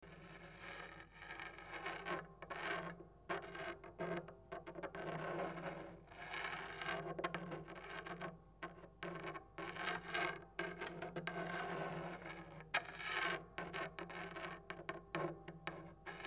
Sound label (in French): tricot; touchers de la harpe